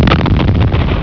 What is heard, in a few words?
boom